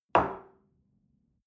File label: espresso place on table soft short